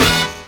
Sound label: HORNHIT, HORN STAB